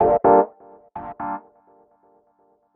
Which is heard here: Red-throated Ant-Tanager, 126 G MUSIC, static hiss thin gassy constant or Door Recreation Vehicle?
126 G MUSIC